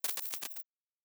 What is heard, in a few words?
Glitch